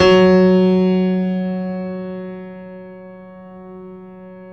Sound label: PED FF F, GRAND PED; Best Service Advanced Media Trax Modern Composer